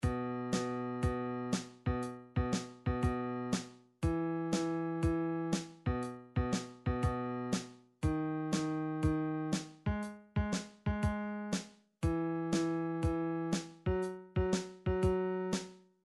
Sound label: drums motown